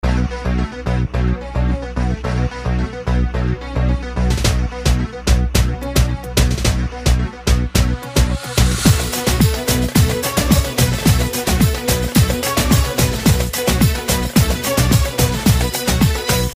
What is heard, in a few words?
Turkish Ringtone